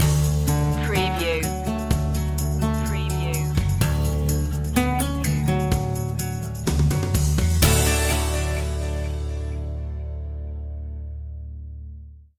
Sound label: home comforts stinger